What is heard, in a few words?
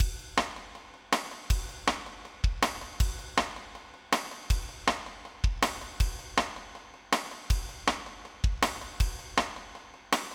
Town Drums; backpack hero gamerip 2022